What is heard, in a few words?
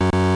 error; bleemsync; Final Fantasy VII